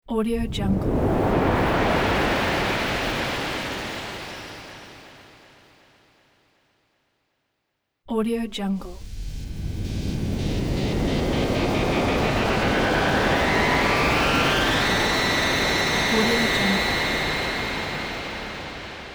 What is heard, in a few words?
glitch swell